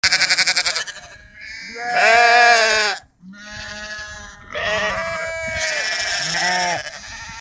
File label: Sheep